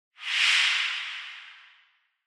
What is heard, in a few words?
Noise Sweep Long